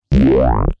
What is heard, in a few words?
bass down